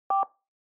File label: Telephone DTMF